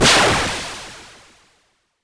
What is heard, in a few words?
Explosion